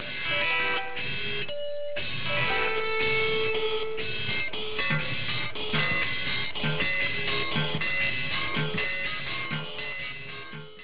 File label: tabla cloches